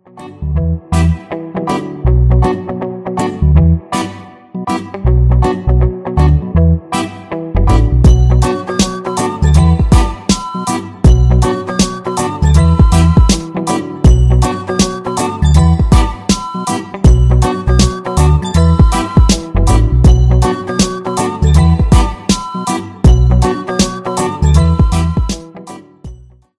carebbean alarm ringtone

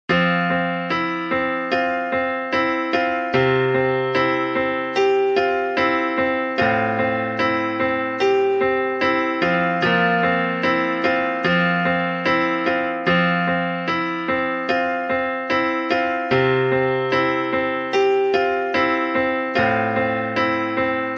sweet iphone 8 ringtone

